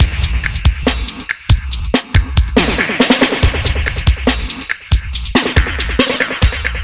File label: synthetic material, 70bpm; Zero-G; Freak Beats